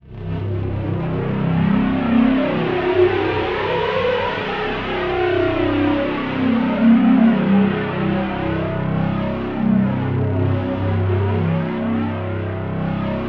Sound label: BIG BASSES; Zero G; Malice In Wonderland